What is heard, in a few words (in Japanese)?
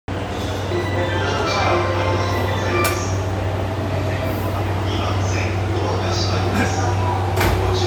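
山手線 高輪ゲートウェイ clockwise, train sounds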